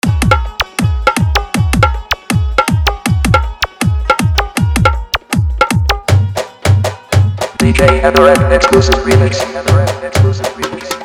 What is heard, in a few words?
Old Treinding Fully Dhollki Bass Mix